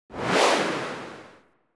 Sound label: High Swoosh; ActivStyle effects